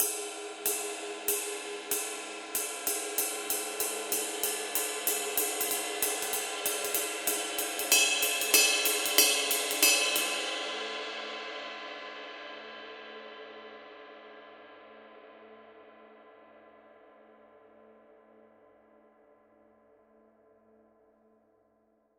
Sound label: 22 dark crisp ride pattern; paiste; masters